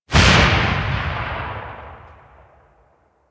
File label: Shot, Weapons; Bronco Spectre; DS Anniversary